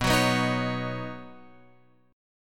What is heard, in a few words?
B Major Add 9th, Guitar, Open F; strummed; x,6,6,6,6,8; JGuitar